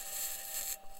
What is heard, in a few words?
mic, mars2020 edlcam